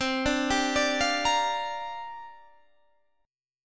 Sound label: C 7th Suspended 2nd Suspended 4th C